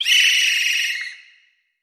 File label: Toyland Celebration Whistles